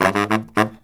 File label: BARYT, Killer Brass Riffs